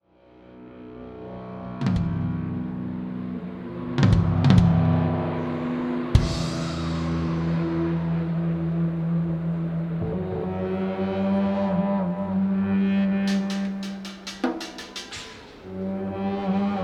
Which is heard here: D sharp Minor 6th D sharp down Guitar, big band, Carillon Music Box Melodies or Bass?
Bass